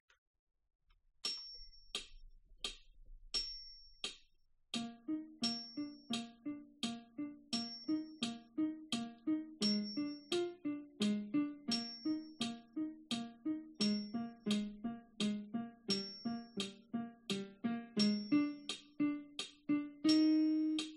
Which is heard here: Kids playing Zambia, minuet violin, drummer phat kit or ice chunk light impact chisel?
minuet violin